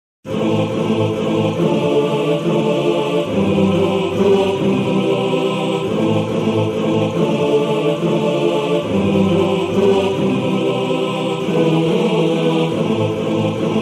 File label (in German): Chor; Lobet den Herren